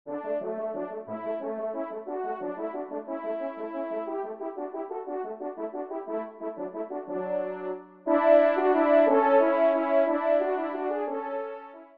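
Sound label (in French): duo, ensemble, trompe cors; Kling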